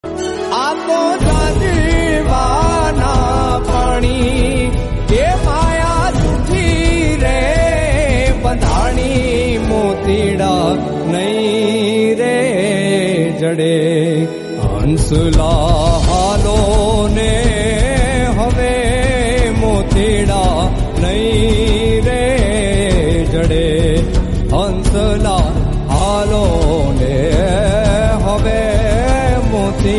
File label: gujrati